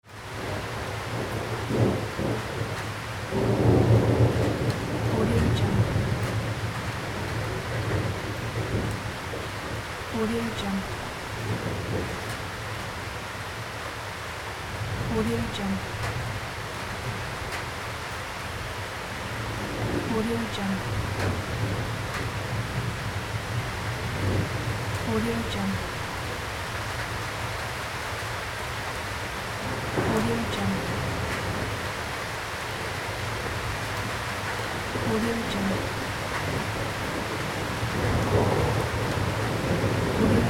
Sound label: rain with thunder